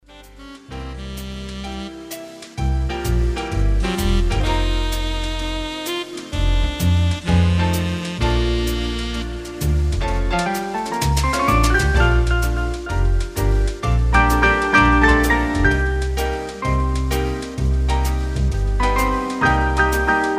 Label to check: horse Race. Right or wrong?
Wrong